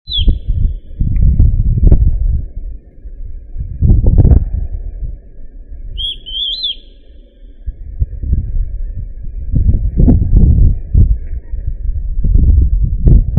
Myrmorchilus strigilatus; Las Vertientes; Jorge La Grotteria

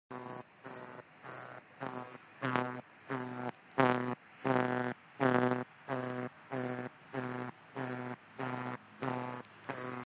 Neoconocephalus; Un macho adulto de color marrón, que estaba a pocos metros de una hembra

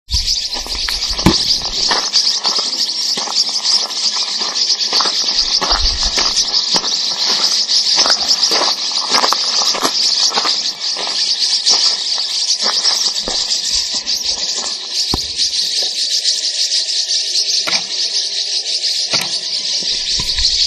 Psilopsiagon aymara; Pucara

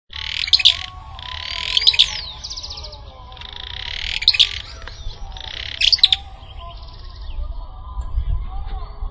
Euscarthmus meloryphus; Barrancas de Baradero

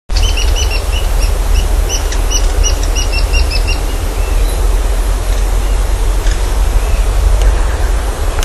Sylviorthorhynchus desmursii; Hua Hum

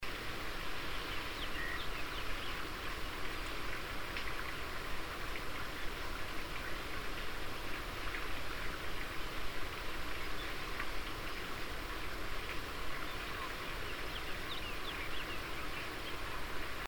Oryzoborus angolensis; Agulhas Negras; Puesto de Avanzada; Planalto; PN Itatiaia; Rio de Janeiro; Brasil

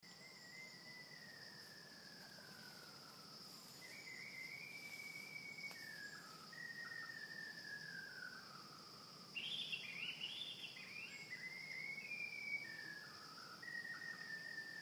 Copsychus luzoniensis; Subic Bay

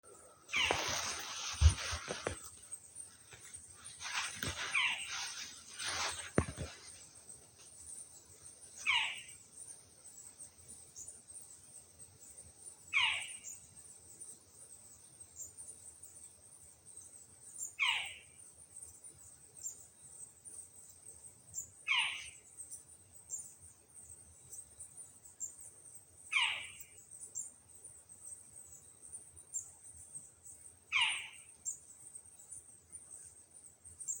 AUSTRALIAN OWLET NIGHTJAR

